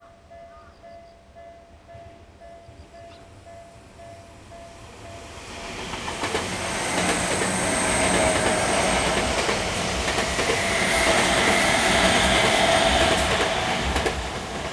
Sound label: train; iq7